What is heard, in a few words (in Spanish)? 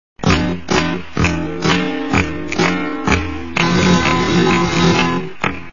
buleria SLOW